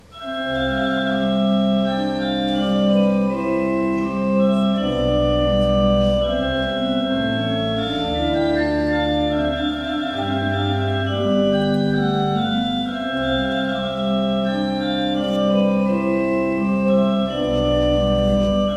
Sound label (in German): orgel romantisch